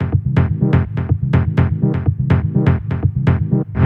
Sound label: 124 Cm, Melodic Loops; M8; Electronisounds